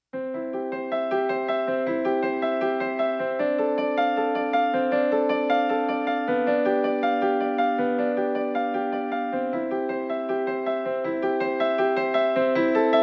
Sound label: Bach Prelude